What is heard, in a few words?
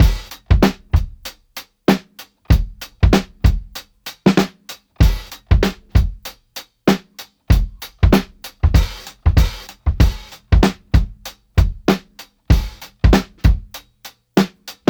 loop; s3000xl; chops